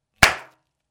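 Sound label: clap sound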